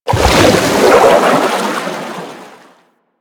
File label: Aqua Board Move Start; Warframe; Yareli